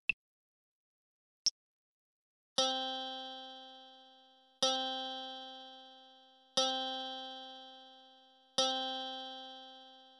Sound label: c guitar